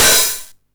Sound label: 909 OHH Tape Sat